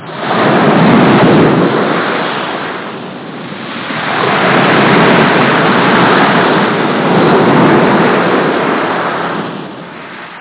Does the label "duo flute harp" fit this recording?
No